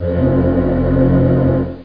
Low Temple; Prince of Persia 2 Demo

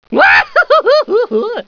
Sound TNT

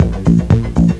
bar, Music; Swoop 1.0.2

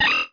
bad attach

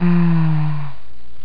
Ha…!; L'Effet Pommier - Volume 04; Sexy Sounds

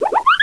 WIBBLE; マジカル刑事

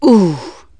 OOH; REDNECK; RAMPAGER

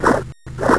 Convert; AmoebArena

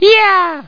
yeah; chase

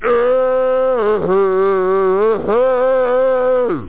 mAAAh!

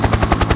heli loop; Pong

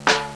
HI MILIT, PERC

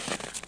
Snow Step, Footsteps

